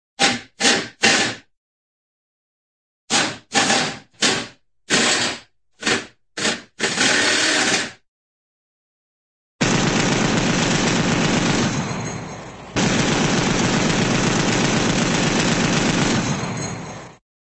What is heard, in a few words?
impact wrench three short bursts; cartoon